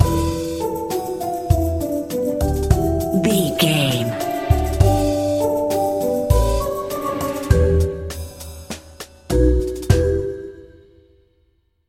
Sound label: stinger